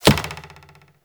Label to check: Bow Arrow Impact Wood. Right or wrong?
Right